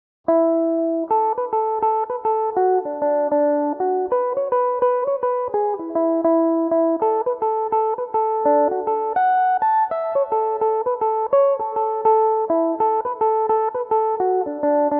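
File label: laugh and be fat jig A major, irish mandolin; Belmuse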